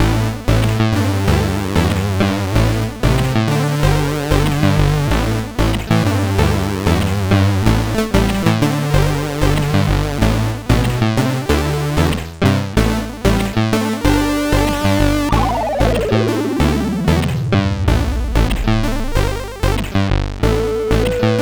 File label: sid CSG8580R5